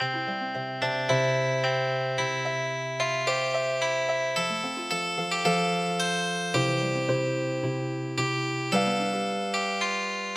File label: Healing Harp